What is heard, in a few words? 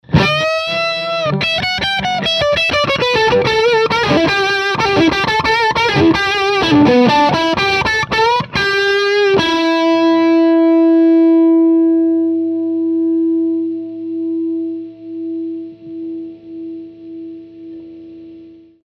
lead